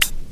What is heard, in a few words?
Hit, Closed Hats